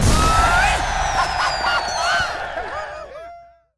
big Win Start; colossal pinatas

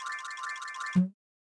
reel spin; fruits

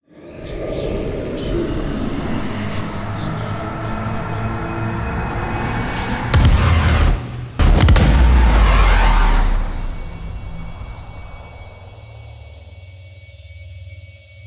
fs evil die; arcane

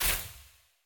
win Symbol Flash; fairyhansel

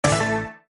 sticky Win; jackhammer

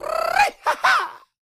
large Win Grito; colossal pinatas

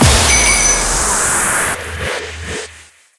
superMegaWinTextChange; jingle spin

